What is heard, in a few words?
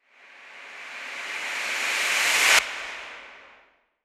FX Uplifter; Mutekki Media; Vengeance Electroshock Vol.2